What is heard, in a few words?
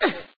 "uhh" female; Palm OS; EDGE